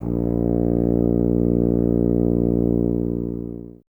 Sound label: tuba; Miroslav Vitous - Solo Instruments VOL-2